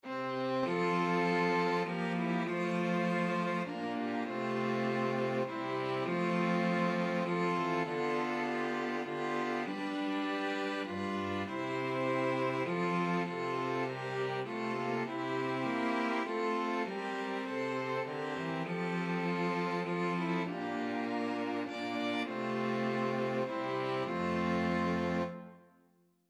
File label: string quartet; crimond